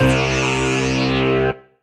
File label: Leadbelly, Retro Synths; Ableton; P-50 Linear; P-50 Structures